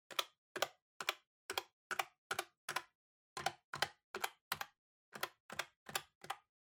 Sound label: Calculator Single Gentle